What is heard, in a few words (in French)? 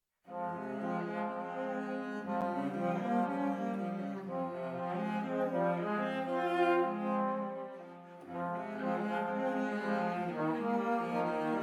avec l orchestre